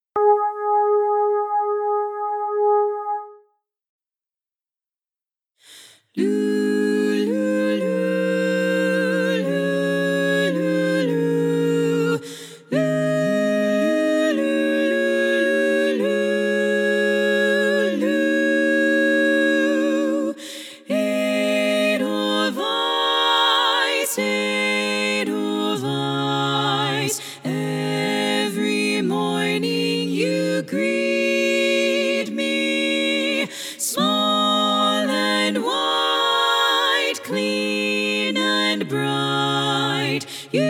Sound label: ssaa tenor